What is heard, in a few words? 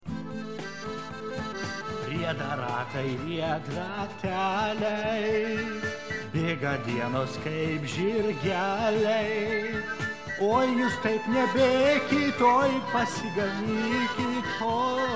HandBell sound track